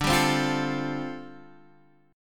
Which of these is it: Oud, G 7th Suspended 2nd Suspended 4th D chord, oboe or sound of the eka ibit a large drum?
G 7th Suspended 2nd Suspended 4th D chord